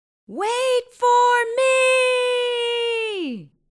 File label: splash; Splash ! Plouf !